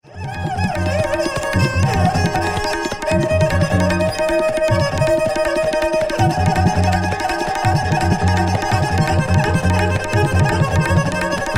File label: Evening Meditation